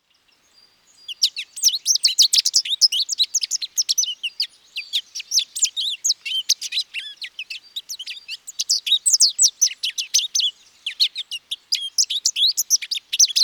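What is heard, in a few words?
sound siskin; zvuki ptits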